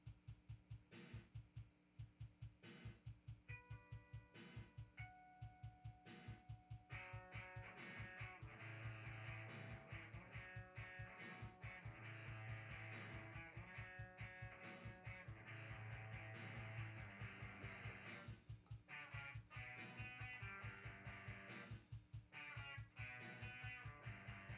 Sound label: Bass Recording